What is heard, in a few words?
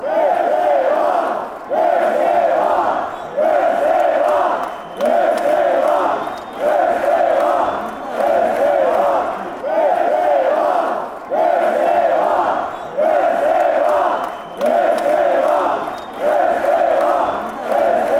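athletic chant, football songs and soccer chants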